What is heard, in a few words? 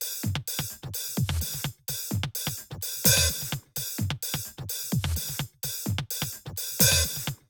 128BPM Kit; Vengeance Samples; VFH1 128BPM Crowded Kit